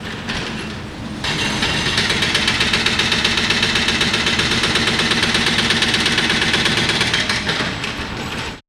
Downtown Houston Criminal Justice Center Jackhammer